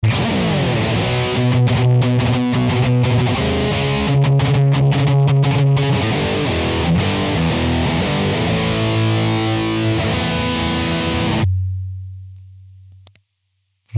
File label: Amp AC Combo High Gain; Simlpifier